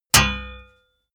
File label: metal impact sword plate